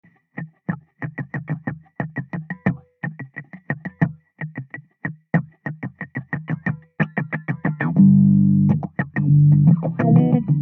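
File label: Gm guitar funky riff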